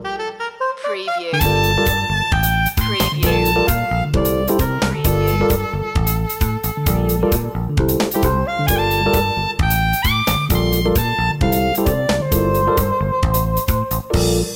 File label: celebrate loop